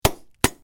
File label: Plastic Pops, bubble-wrap